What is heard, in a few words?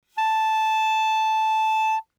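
Eb Clarinet fortissimo A4 stereo; Woodwinds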